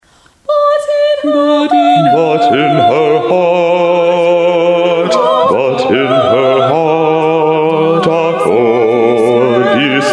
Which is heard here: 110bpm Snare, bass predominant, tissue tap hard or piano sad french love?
bass predominant